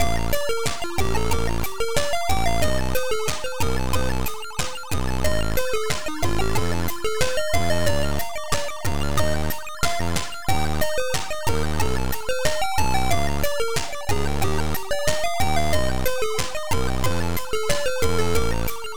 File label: Russian style